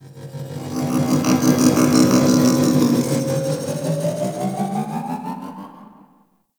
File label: Grungy Drone Passby; Ambience; Warframe; Corpus Railjack